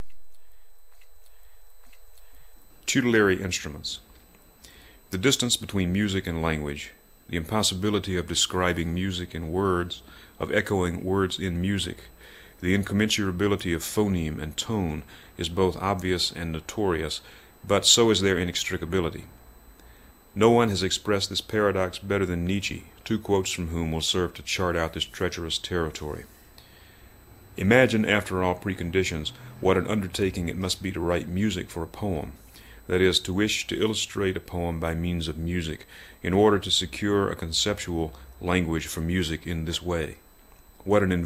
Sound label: instruments